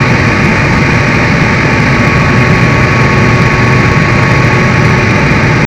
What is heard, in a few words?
Jet engine; HeneGames; Simple Airplane Controller